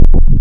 AKWP pluck algo, wavetable; Adventure Kid

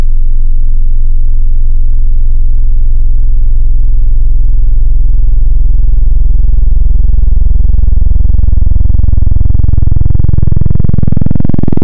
Analog PWM Sub

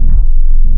flute, wavetable; Adventure Kid

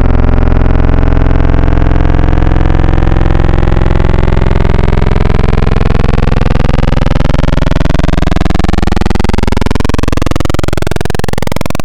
Analog PWM Custom

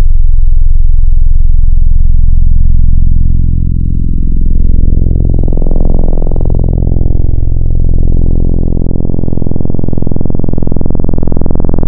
FM, Wavetables

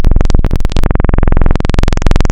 bw saw wavetable 2048; Adventure Kid

